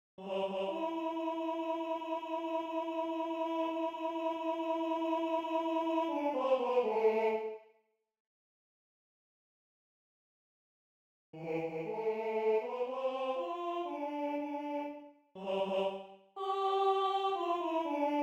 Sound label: Tenor I; Aus der Traube in die Tonne